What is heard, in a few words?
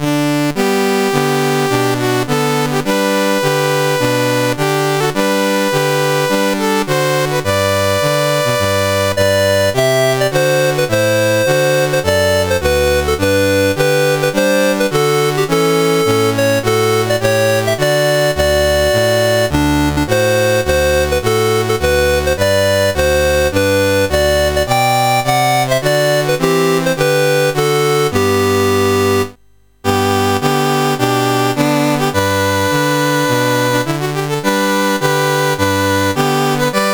Gabon, National Anthems, CSG8580R5